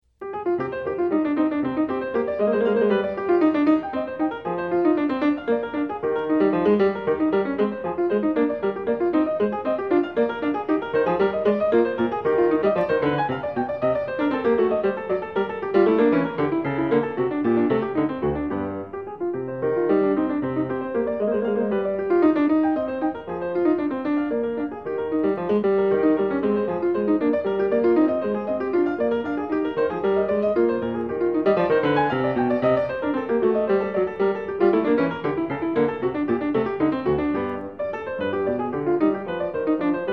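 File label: courante, piano; BACH; FRENCH SUITES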